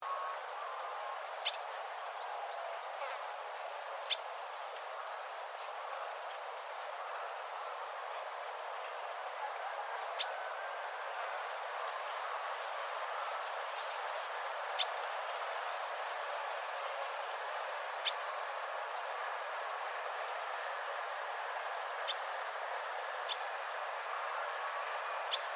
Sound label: L senegala contact; Host Song Clips